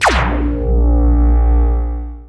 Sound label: synth laser; Earth and Beyond; EA Games